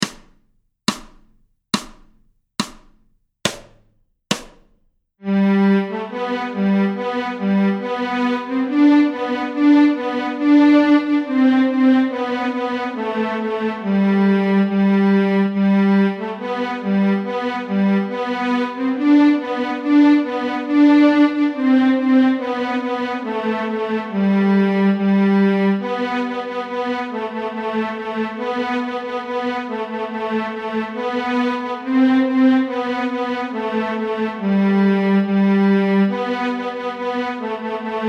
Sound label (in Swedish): Stråk G, Nyckelharpa; Friarvisa; A-strängen Steg 1 Två toner i dur